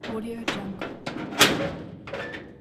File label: old industrial door slide latch